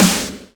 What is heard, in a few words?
Snares; Vengeance - Essential Clubsounds Vol.4